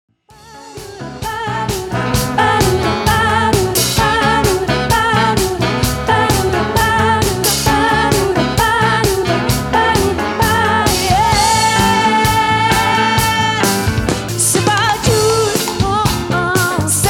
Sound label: Funky Floor mix